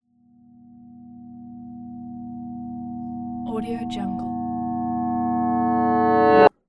tension; fascinatedsound

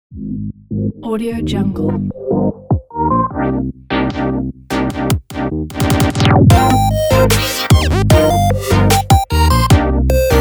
futuristic japan pop; mark-music

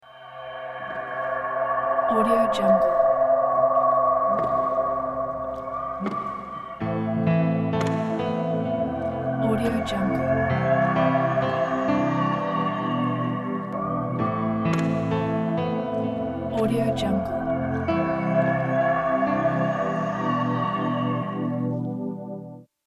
boat

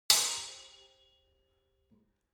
weapons sword hit fast sword single

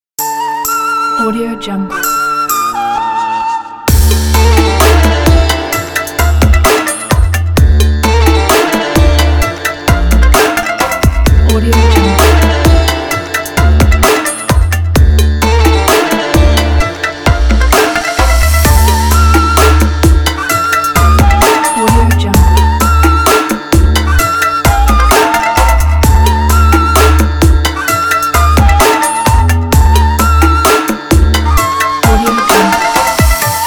arabic trap

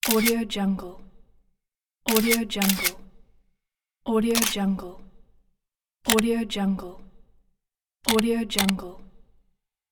camera shutter

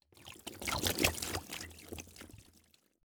waterflow; soundbits-soundfx

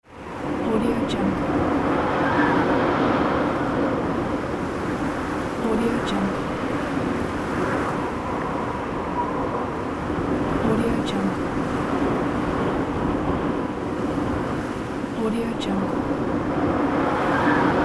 windy

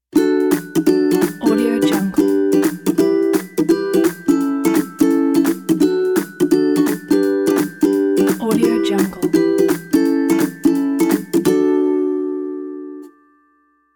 a fun logo

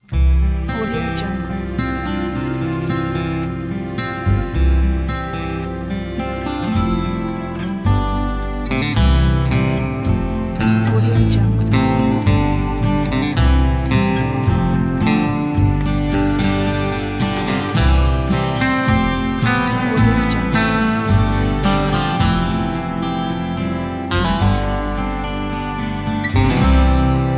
wilderness retreat

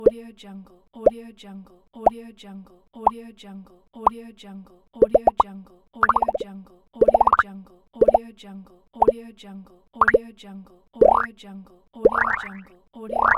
pops

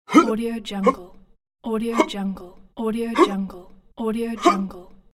jump exhale

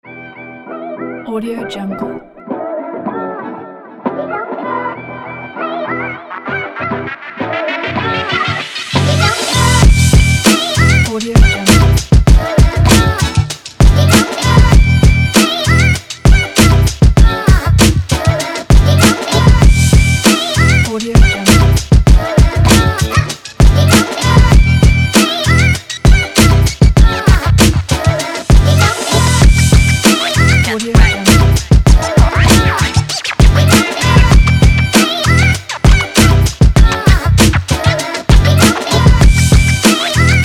spring hiphop

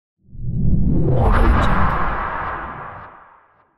massive swoosh

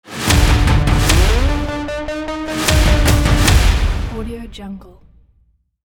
apocalyptic logo

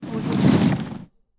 fire magic spell cast; stormwaveaudio

